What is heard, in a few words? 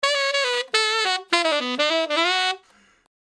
brass loop